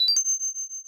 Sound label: twinkle; sonic